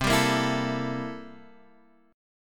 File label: C 13th C